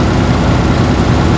truck; Mafie 1

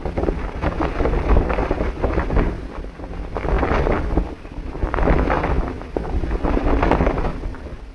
cloth; Mafie 1

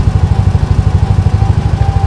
cord; Mafie 1

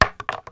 wood; Mafie 1

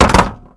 wood; Mafie 1

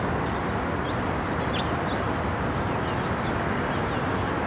ambi town; Mafie 1

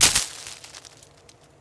bush; Mafie 1

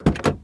door op; Mafie 1

